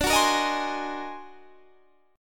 D sharp Minor Major 13th D

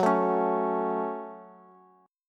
F sharp Minor F sharp, Bass

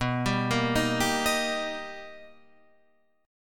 B Augmented Major 7th B, Guitar, Drop D

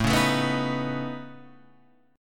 C sharp 7th Suspended 2nd Sharp 5th A

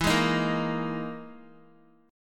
E Major 7th Flat 5th, Guitar; strummed

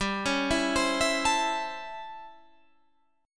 A 7th Sharp 9th

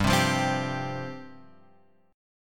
G 7th Suspended 4th G down Guitar Open F; G7sus4 strummed

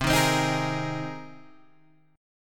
C Minor 7th Sharp 5th C down Guitar Open G

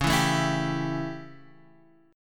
A 7th C sharp, chord

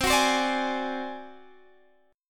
Ab 7th Flat 5th C, chord, guitar

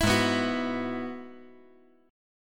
Ab Minor 7th Sharp 5th Ab; strummed; 9,8,7,9; 5G,4C,5E,5A

